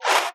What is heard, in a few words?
Dash; Celerity